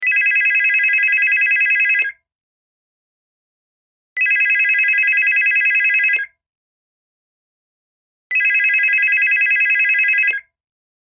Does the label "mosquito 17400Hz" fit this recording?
No